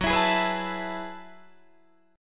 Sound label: F sharp Minor 11th, Guitar, Drop D